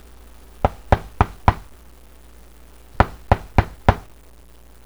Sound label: KNOCK